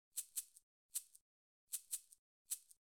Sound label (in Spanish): Ritmo Shakers; Kaani